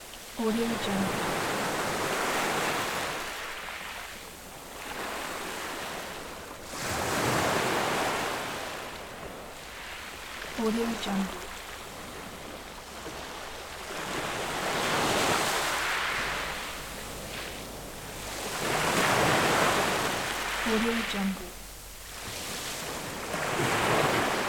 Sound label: sea waves